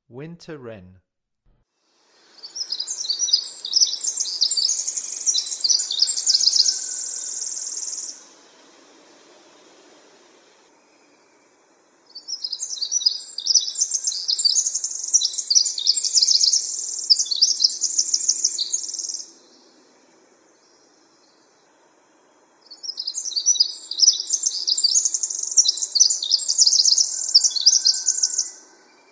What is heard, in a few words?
Winter Wren, Songs of Birds of North America; Western USA; Western USA Songbirds